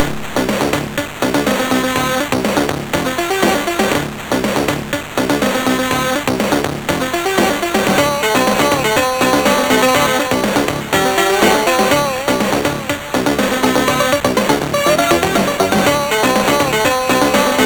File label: Music, sid, MOS6581R4; Lamiga